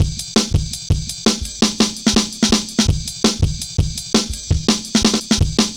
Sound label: Jazz Ride A, Drum Loops, 166.5; Jungle; Zero-G Jungle Warfare 1, 2 & 3; 90 s Sample CDs